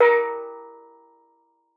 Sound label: A#3, STEEL DRUM; Xsample VOL-4